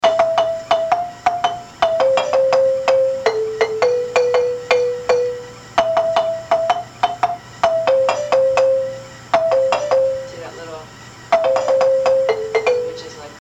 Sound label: lead high answer var, marimba; manhanga